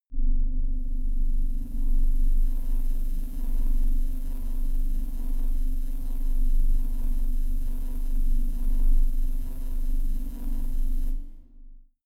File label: sci fi